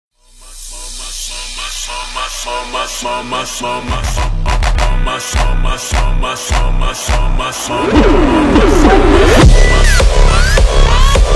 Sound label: Hardstyle Remix Slowed